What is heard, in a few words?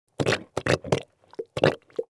Plumber working plunger suction